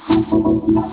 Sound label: tekkno eq reverb